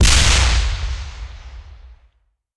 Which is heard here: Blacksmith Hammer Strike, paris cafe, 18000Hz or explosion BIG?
explosion BIG